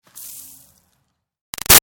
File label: Snow Dust Drop Light; Weather; Big Room Sound